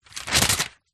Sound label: household telephone directory yellow pages page tear out